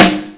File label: loud snare drum sound d sharp key; snare drum samples